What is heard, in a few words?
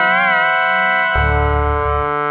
Org bend, Funk Keys; Sonic Foundry (Sony Creative Software); Synchro Funk